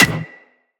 Melee Staff Impact Generic

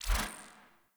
UI Default Theme Gear Wheel Transition

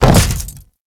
Grineer Land Default, Footsteps; Enemies; Warframe

